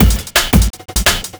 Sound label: Beat, Drum N Bass